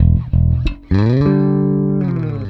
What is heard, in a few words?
Electric Bass; Basses